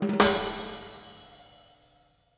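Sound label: Timbali Splash; Sonic Foundry (Sony Creative Software); Joe Vitale Latin Percussion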